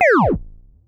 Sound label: Sci fi